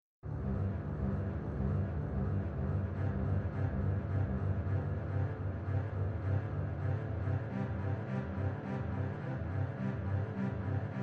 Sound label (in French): Duo contrebasse; contrebasse 5 cordes; ctb 5 cordes